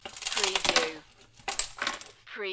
Ice Cubes, Twist Ice Cube Tray, Fall Onto Kitchen Worktop